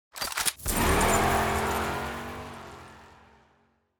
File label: Push Notification